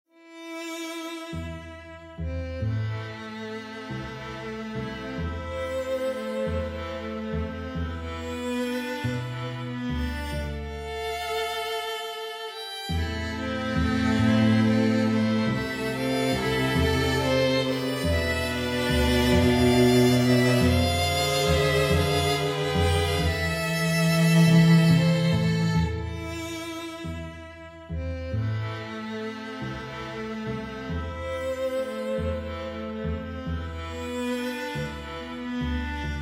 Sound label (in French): VALSE MELANCOLIQUE